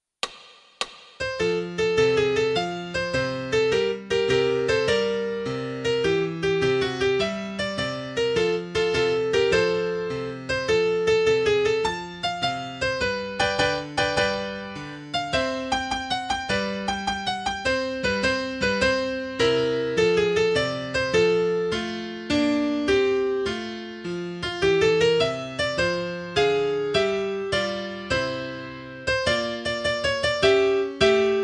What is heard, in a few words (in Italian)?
Piano ascolto; Liberty-bell